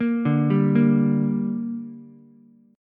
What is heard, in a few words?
G Minor G down Ukulele, chord; arpeggiated; JGuitar